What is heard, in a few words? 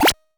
Pick